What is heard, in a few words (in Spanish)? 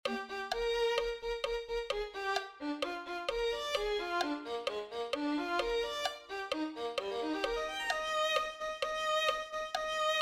violin2